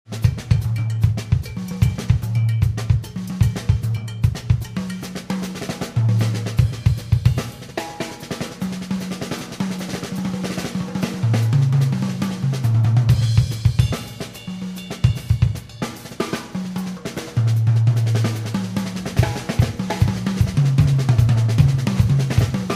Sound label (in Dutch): Drumsolo